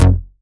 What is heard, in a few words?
Synth Shot; Vengeance Samples